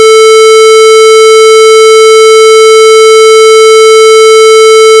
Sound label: square 440Hz